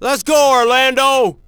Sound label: "Magic", fan; NBA Inside Drive 2004